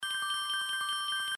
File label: win incrementing; Holy Goat